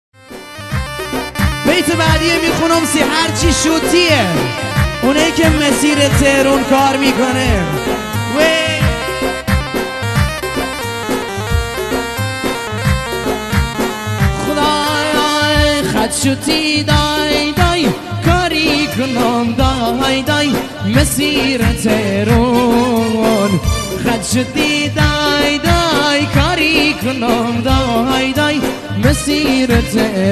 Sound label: remix